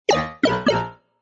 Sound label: error